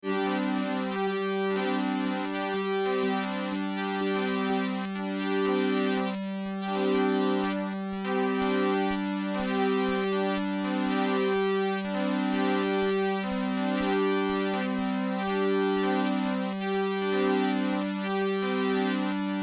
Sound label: harmony Notch; tellun